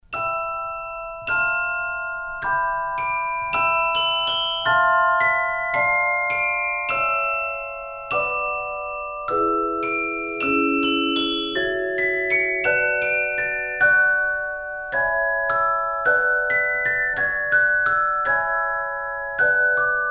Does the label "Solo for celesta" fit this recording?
Yes